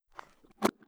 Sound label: Close; NzBulletStudio; NZ Simple LookDev